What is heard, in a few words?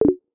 leave call